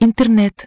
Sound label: menu; Hyundai H-MP718